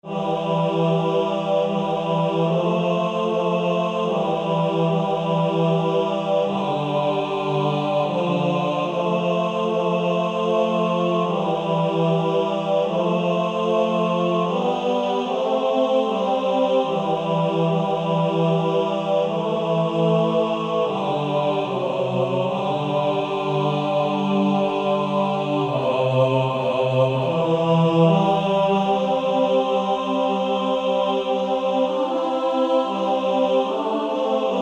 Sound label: Male Choir; Low In The Grave He Lay